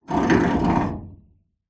foley metal grind scrape on metal hollow large

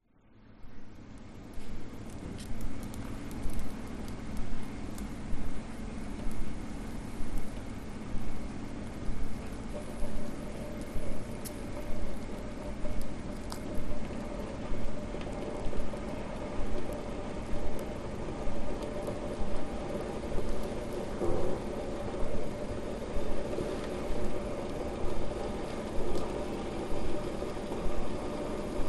Boiling soup; west wolf

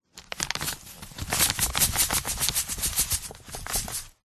food sugar paper bag shake; zapsplat

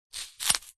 food apple knife twist crush split

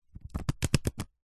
leisure playing cards stack fast; zapsplat

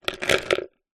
office pencils in plastic large pot movement; zapsplat

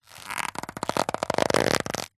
leather wallet bend stress creak

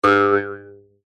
cartoon spring boing jump jaw harp

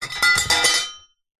impacts metal hollow pieces drop

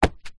footstep single on loose wooden panel; foley

